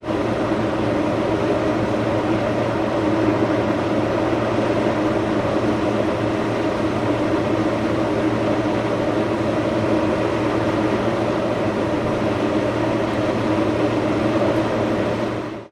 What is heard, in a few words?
fan large, industrial fans; Large industrial type fan rotating loudly. Industrial, Fan Blower, Air Motor, Roar